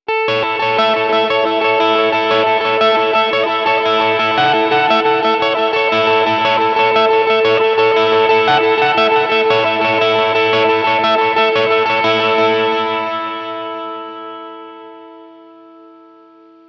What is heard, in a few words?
arpeggio